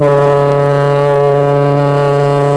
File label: ships horn; BSA Sea Scouts and Explorers